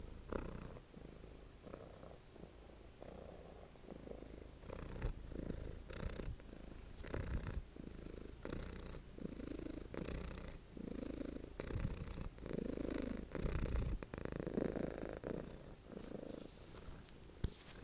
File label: the most contented sound in the world purring cat